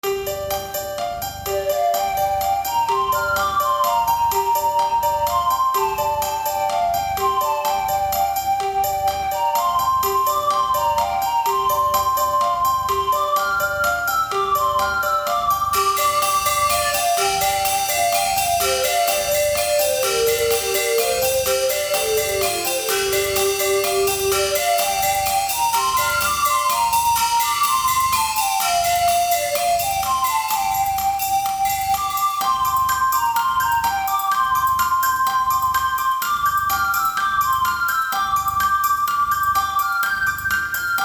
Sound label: flute and bells